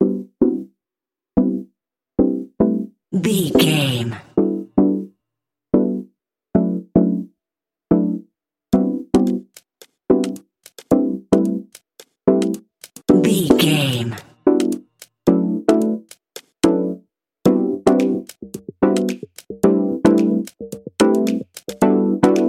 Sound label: digital joy